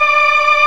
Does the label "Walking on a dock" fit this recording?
No